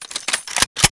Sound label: Machine Gun Reloaded